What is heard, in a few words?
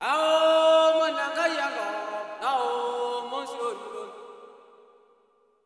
SOLO G#, MALE CALLS; Spectrasonics; Heart of Africa 2